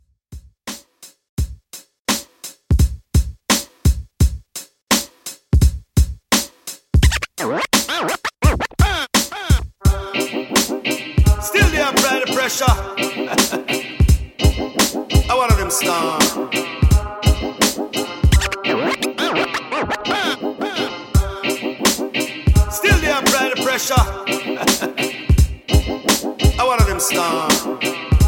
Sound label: DanceHall Drum 85Bpm